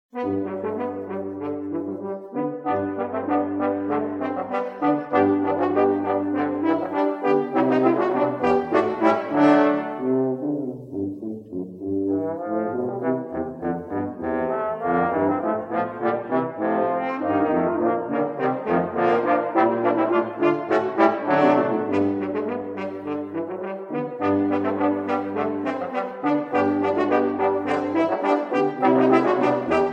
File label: trombone quartet